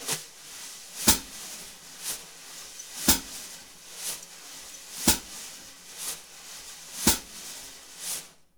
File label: BRUSH, Jazzistic; Univers Sons